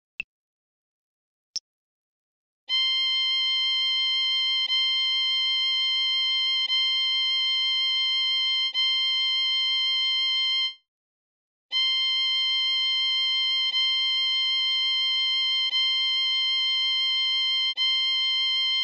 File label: c violin